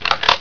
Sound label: reload; svencoop; classic; scattergun